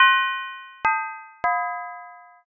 metal drop

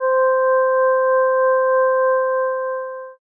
tibet bell

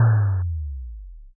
impact text

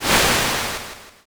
swoosh

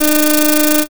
slot spin

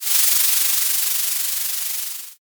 frying

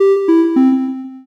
picosad

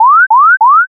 scan

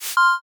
transition

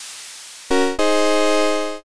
challenger

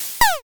sneeze

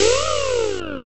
thunder beast

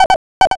frog

